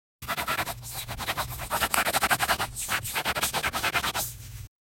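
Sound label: anime boy energetic writing, pencil scratch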